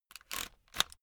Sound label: headphone extend unsteady slow